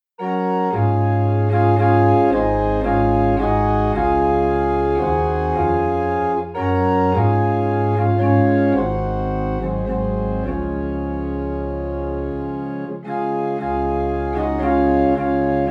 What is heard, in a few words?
Organ in D Flat; He Hideth My Soul